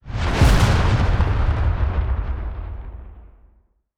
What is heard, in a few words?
kaiju titan muzzle fuel explosion; Stellaris; biogenesis; weapons